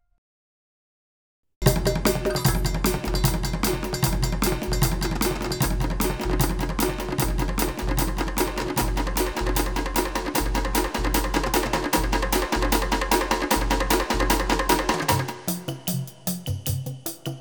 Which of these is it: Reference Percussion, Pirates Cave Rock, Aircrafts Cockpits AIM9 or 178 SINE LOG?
Reference Percussion